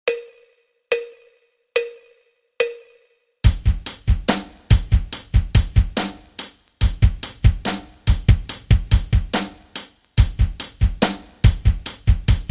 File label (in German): rock rhythmen